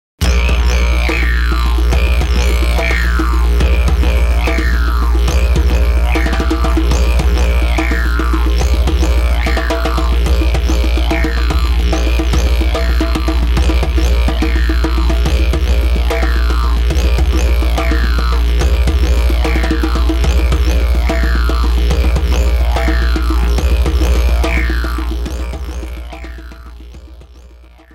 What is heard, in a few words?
Djembe